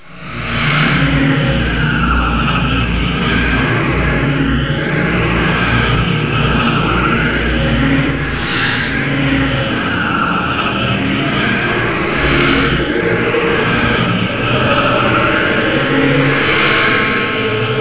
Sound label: flash die; Hexen II